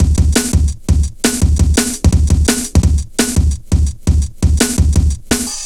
Steppa, Jungle, Drum Loops 169.5; Zero-G Jungle Warfare 1, 2 & 3

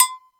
AGOGO; Greytsounds VOL-1

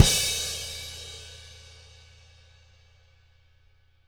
CW KIK -R, Living Drums, SD CYMBALS; Sampleheads; Peter Erskine

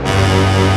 LOW, LOW BRASS; Roland - LA Composer vol. 1

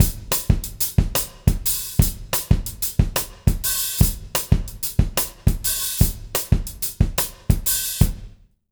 Jazz Latin Drumloops; USB Soundscan vol.08; AKAI

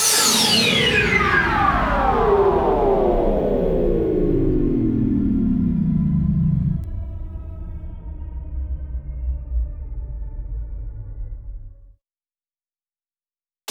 Fall, Hits & Falls; Zero-G Trance Inducer

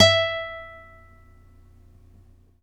NYLON P E4, GUITARS; Synclavier Sampler Library

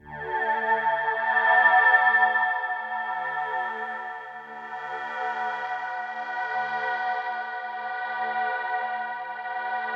TRANSFORMED, Ambientpads; AMG; Global Trance Mission VOL-1

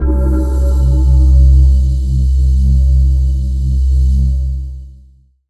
Atmo Pad; Zero-G Jungle Warfare 1, 2 & 3; 90 s Sample CDs

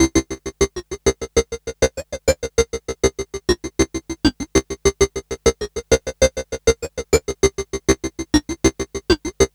XPANDER; AMG - Vince Clarke Lucky Bastard

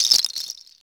ADD PALM; Optical Media International; Sonic Images Library; SI FX Vol 10

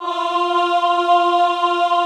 AHH F3, Extended Classical Choir; Best Service

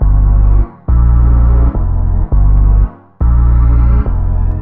Bass, Synth Bass Loops

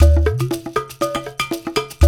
120 UDU, African Contemporary; Spectrasonics; Supreme Beats

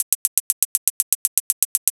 NRG Cymbal

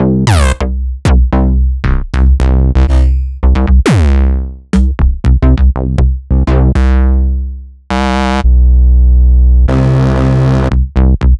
Basses; Electronisounds

